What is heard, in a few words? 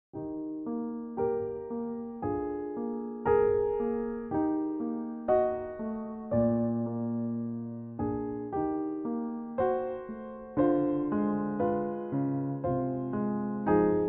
goodnight song; volkmann robert